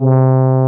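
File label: FM French horn C4; FM FRHORN C3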